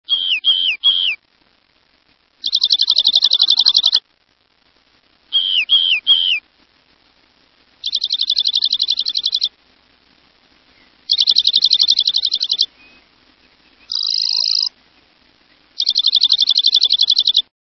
Rock Wren

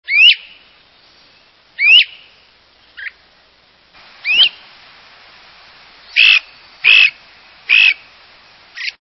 Great crested Flycatcher, songs

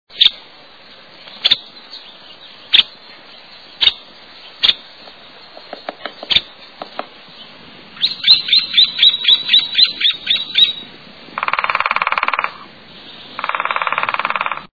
Nuttalls Woodpecker, songs

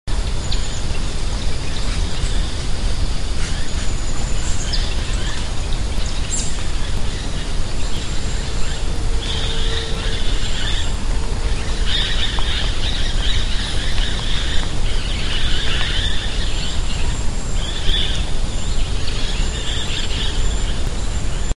Lilac crowned Parrot

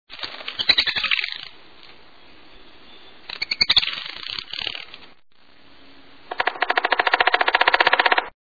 Black backed Woodpecker

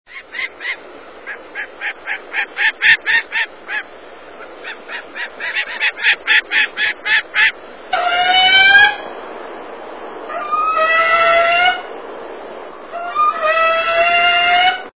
Gyrfalcon, songs

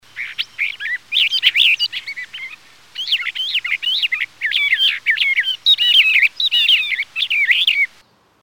Bendires Thrasher

